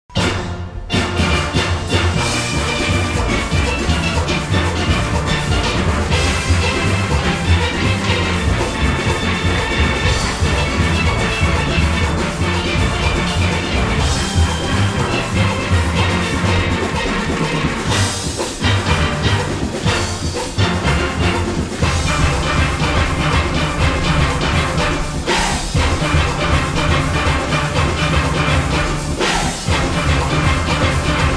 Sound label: Rain Melody